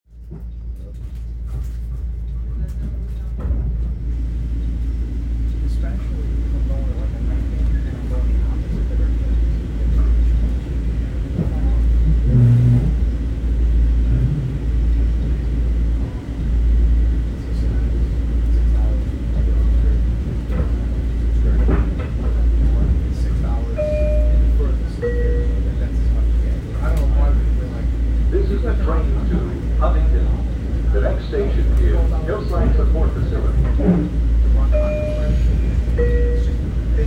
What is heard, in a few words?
Huntington LIRR; Location: LIRR Train to Huntington at 9:40pm